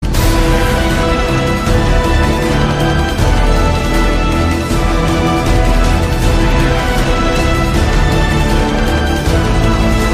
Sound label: Epic Theme; Escanor